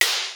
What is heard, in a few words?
DR-660 DNC, DRUMS PERC; Rubber Chicken Software Sample Bank